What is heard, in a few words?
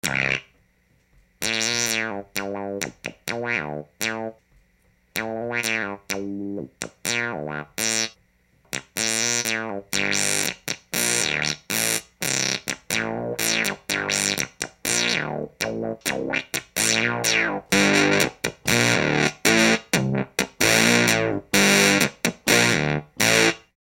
minimoog, MOOG Minimoog Model D